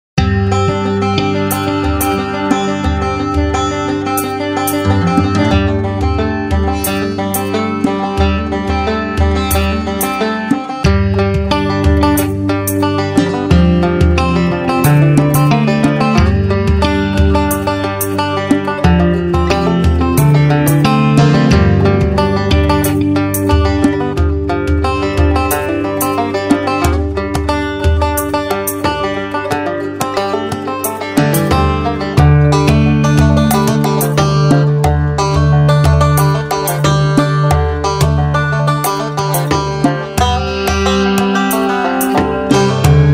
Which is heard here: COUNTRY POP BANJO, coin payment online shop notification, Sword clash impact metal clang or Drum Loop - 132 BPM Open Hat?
COUNTRY POP BANJO